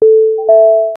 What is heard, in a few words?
create ui and menu sound